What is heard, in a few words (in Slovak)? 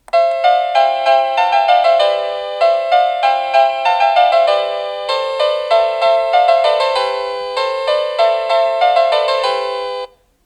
Ukážka melódie zvonenia zvončeka